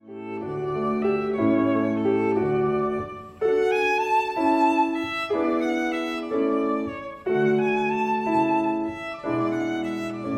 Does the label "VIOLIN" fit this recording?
Yes